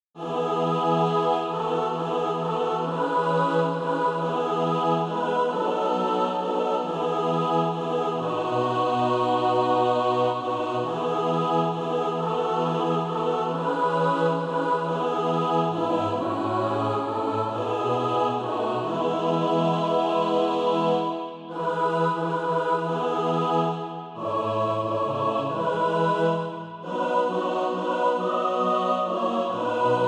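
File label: mixed, SATB Practise Music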